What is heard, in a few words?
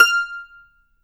MANDO NOTES, F6; Sampleheads; Whole Lotta Country 1 & 2